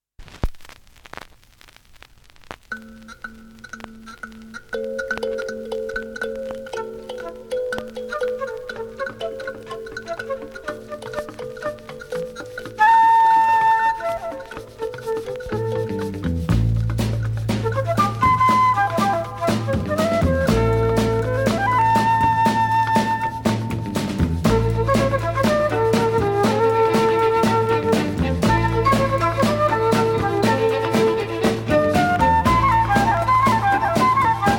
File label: SOULFUL STRINGS